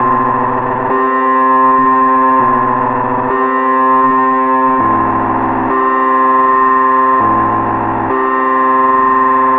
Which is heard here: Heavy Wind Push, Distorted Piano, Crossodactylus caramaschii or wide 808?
Distorted Piano